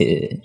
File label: laugh